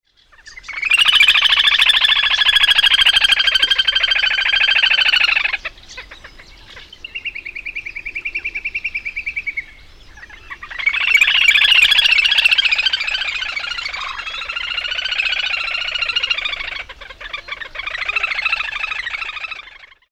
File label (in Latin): Tachybaptus ruficollis